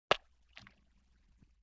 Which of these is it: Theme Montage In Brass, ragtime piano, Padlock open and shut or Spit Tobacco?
Spit Tobacco